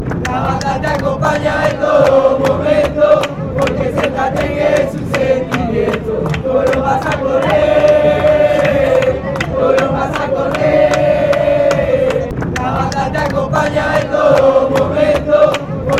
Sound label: union chant, fanchants